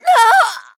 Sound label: Death